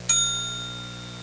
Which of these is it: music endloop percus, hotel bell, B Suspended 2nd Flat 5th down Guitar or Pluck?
hotel bell